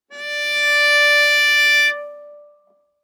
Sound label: Viola arco ff sul A D5 stereo; MIS Pitches - 2014; Strings